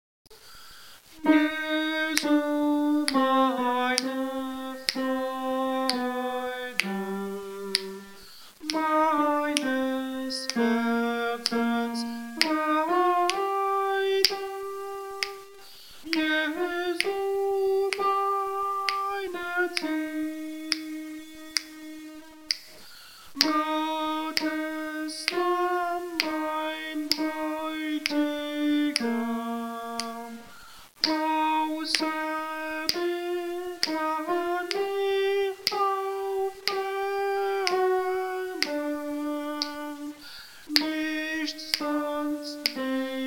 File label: jesu meine freude Alto